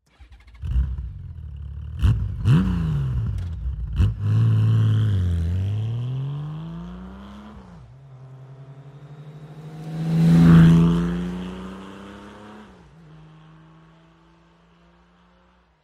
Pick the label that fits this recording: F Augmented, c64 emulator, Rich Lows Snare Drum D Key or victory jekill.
victory jekill